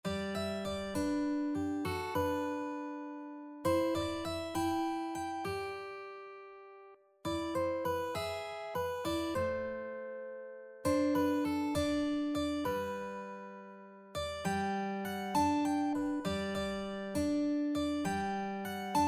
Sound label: eye is on the sparrow, mandolin